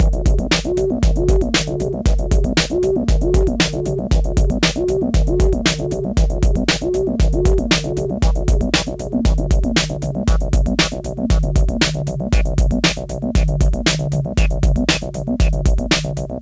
Pulseloop; Space Ribbon

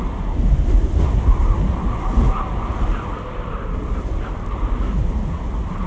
Wind; Goddess of Trampling

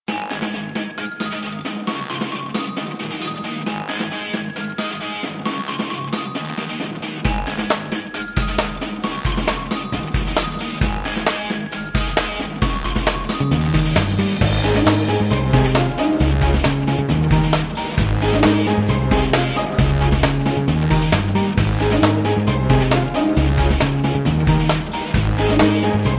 battle loop; guns n glory wwii android game music

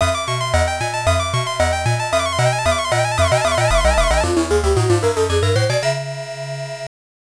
Game Start Sound

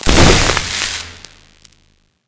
electric surge blast; Magic Spells

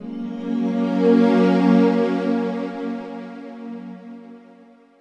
Pad; Dr Watson Pad; Proteus

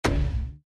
Impact; Fancy Pants World 1 Remix

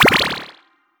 Add Bomb; Pac-Man Championship Edition 2 Plus

